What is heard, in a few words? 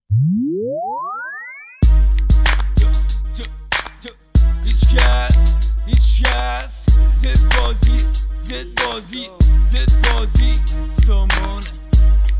Rap